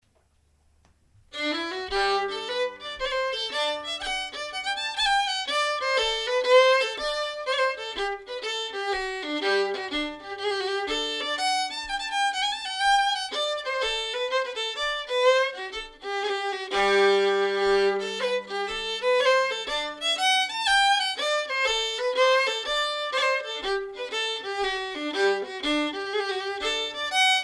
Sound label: Hornpipe